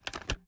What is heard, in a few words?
reload empty drum end; gmod